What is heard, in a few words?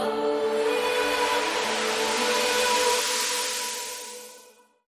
Link Sting